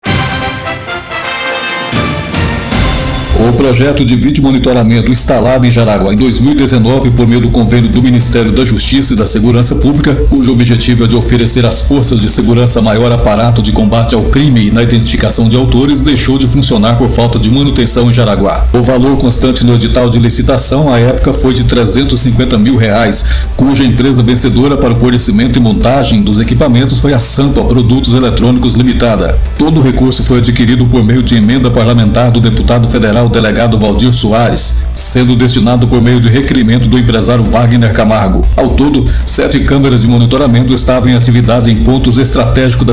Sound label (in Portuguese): VINHETA; CAMERAS